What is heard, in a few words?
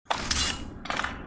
draw sword